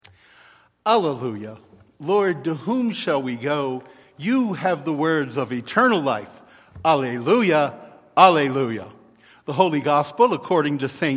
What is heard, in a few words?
Alleluia - Holy Gospel; Easter Sunday